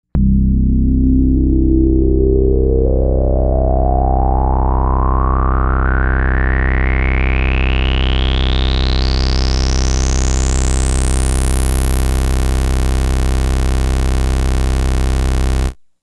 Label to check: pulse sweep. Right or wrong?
Right